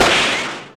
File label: GUN; INDUSTRIAL